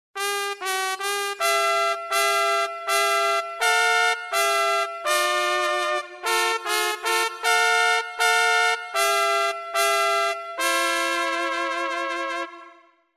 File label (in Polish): trąbka